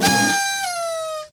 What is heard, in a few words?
cannon fail